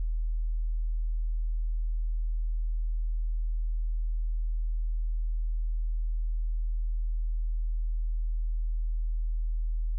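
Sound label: Sine 40Hz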